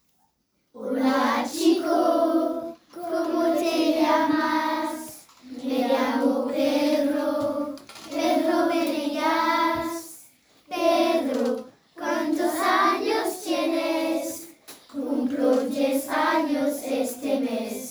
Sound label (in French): chant